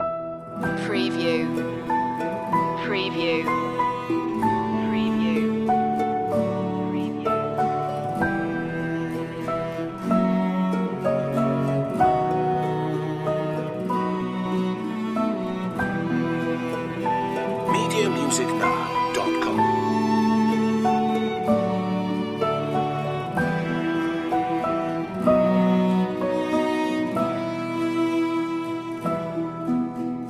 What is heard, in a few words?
greensleeves loop